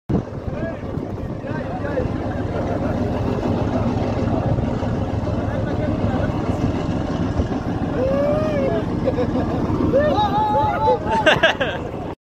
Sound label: lenj, لنج